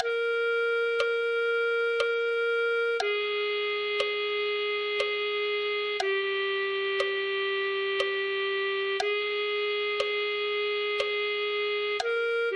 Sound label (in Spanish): clarinet1